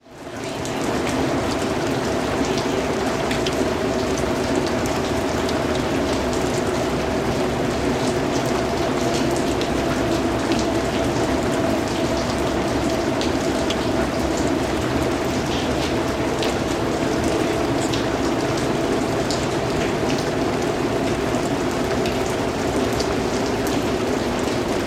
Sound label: Rain Alley Ambience